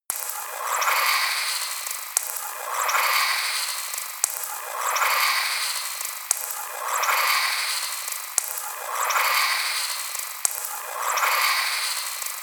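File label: space bubbles high sequence, Science Fiction; sciene-fiction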